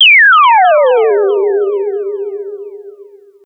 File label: Space Twit; Pyrex